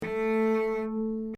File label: cello; orchestration